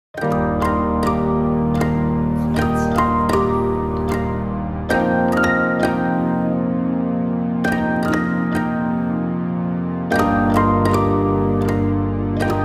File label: piano pizzicato